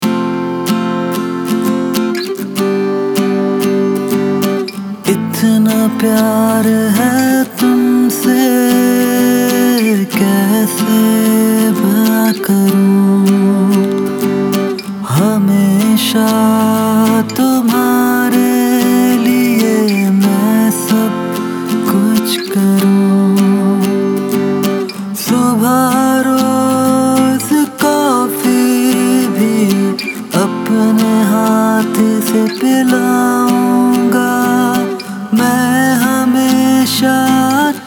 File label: male sample